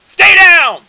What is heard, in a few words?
throw; Opposing Forces; FSoldier